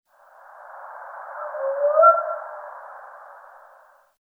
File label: Whoop